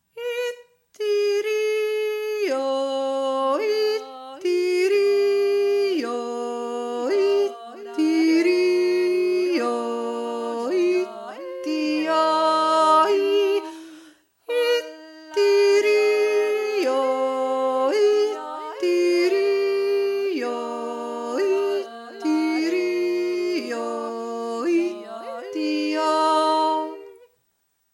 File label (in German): anreiter dreier hauptstimme